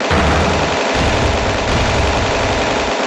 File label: breaking clap